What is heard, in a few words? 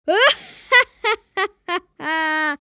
Funny kid spooky laugh